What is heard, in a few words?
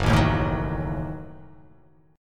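Gb Minor 6th Gb, 8 string; strummed; JGuitar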